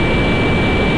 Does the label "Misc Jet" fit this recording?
Yes